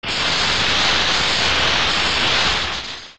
Sparkle; Ganondorf

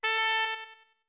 Pipes A; Deku Pipes

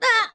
Hurt; Link getting hurt

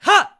Jump; Link jumping off a ledge

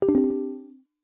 Text Done; The Wind Waker; Dialogue finished

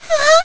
Girl Gasp; Girl (same) gasping; Girl (same) screaming

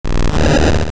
Push; Link pushing an object

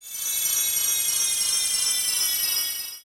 Great Fairy Disappear

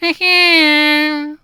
Hey Hyehh; The Great Tripini: "Hey-hyehh..."; zelda

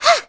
Throw; Medli being thrown; Zelda

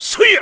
Swipe; Ganondorf; Zelda

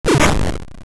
Gust Jar Blast

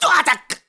Hurt Fire; Link hurt by fire; Skyward Sword; zelda

